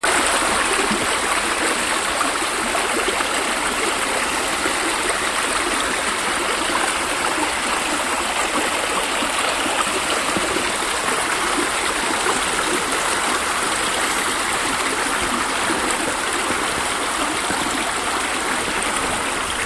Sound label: Brook sound